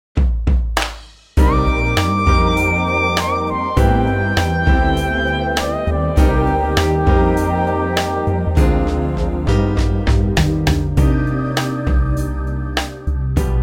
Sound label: smooth Cm; key - Cm - vocal range - C to Eb; Smooth arrangement; cool sounds, a great groove and a fresh feel